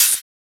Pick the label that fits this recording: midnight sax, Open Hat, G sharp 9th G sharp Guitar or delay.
Open Hat